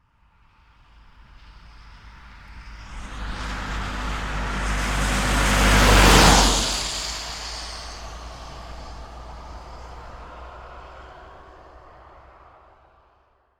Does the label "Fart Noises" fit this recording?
No